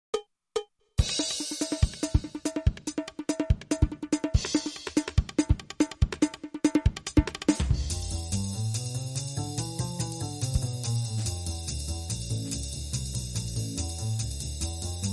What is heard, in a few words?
Jazz Kit Loop